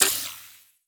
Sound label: Future Sounds, Interface, Futuristic; ArmyRaid